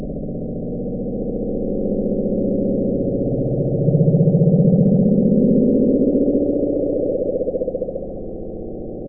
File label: bloop